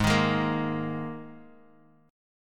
G sharp Suspended 2nd, Guitar, Standard; strummed; 4,6,6,3,x,x

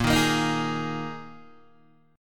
A sharp 7th Suspended 4th down Guitar DADGAD

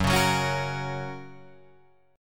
F sharp Major, Guitar, Drop D

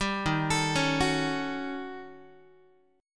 Eb 7th Sharp 9th Flat 5th, Eb, Guitar; Eb7#9b5 arpeggiated; 10,11,12,11,10,x; JGuitar

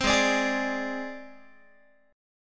G sharp Major Flat 5th, Guitar; G#Mb5 Chord

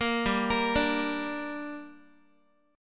G Minor G down Guitar, chord

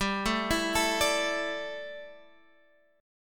D Suspended 2nd G, chord; Dsus2/G; arpeggiated

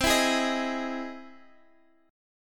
E Augmented Major 7th C

